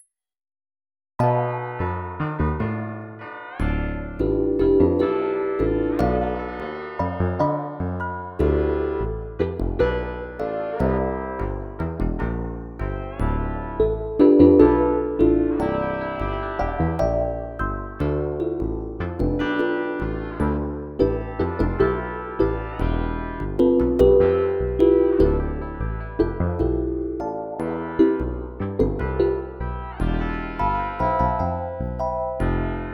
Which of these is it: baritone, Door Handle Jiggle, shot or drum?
drum